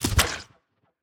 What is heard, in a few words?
Spiters